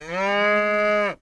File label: cow